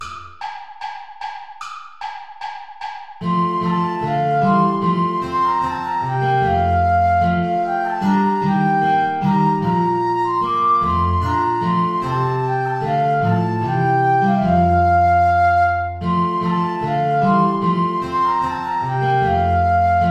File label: ballet play along without guitar; praetorius michael